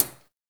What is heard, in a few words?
CHH; Spectrasonics; Liquid Grooves